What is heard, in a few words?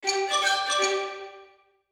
xylo passage